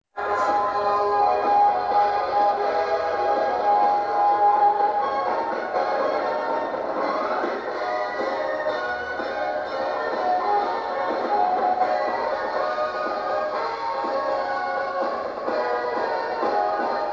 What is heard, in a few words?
high notes